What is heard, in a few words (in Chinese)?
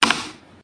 打翻保温盒